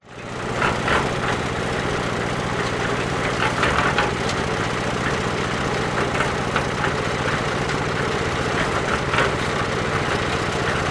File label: industrial cement mixer operating